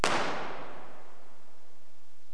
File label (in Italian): palco, Teatro dell'Aquila